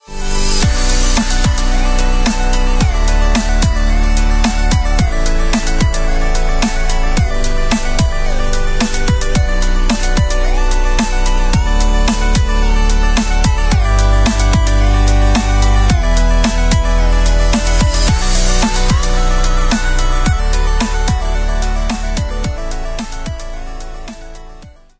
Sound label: EndLevel; dashy square